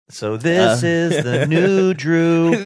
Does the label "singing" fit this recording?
Yes